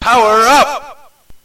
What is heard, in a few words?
powerup